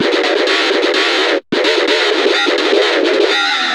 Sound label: GTR LOOPS